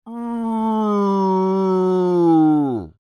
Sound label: Creature Moan Long, Human; Groaning; Voice; BigRoomSound